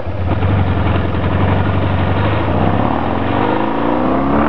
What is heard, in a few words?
startup; Tune Up